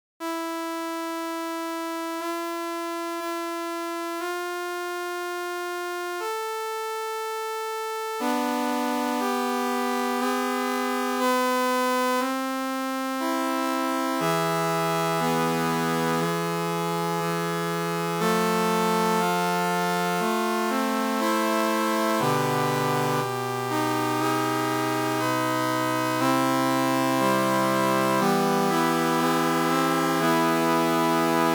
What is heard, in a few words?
enharmonico Molle Fugue